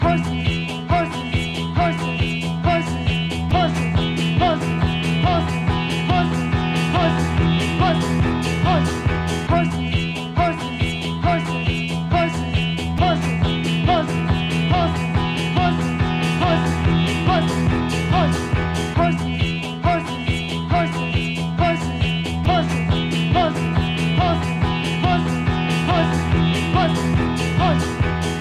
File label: RINGTONE